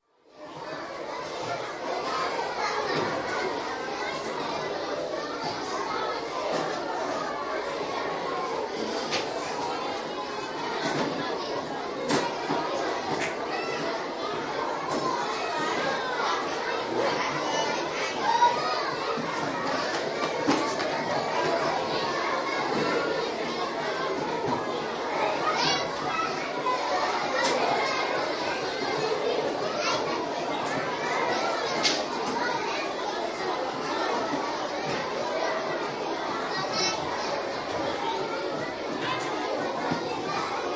Primary School, lunchtime atmosphere in school hall, lunchtime, mixed infants to 11 year olds, school bell rung; School Sound Effects; BBC